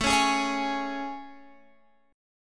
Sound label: A Major 7th A, Guitar, Open F; AM7; strummed